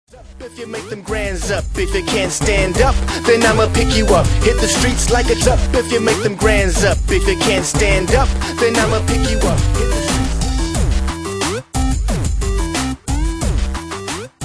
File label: hiphop